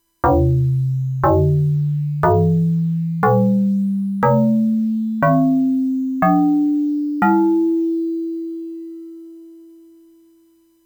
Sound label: drum, FM; PIC32; Sound synth; FM examples